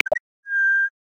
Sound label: Butcher, Australia; Xiaomi Redmi-Note-8-Pro